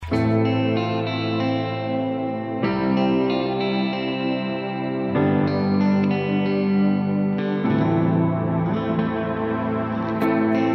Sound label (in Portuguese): arrocha, sertanejo